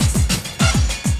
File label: loop, 200 BPM; Ueberschall; Drum & Bass Resonance